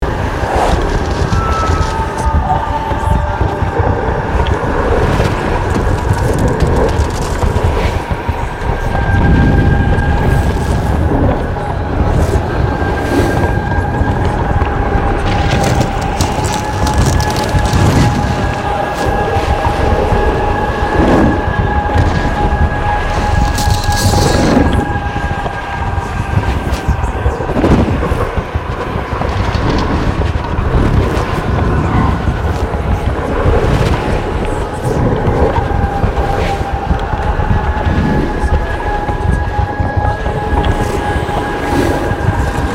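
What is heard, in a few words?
Ambience Space Distant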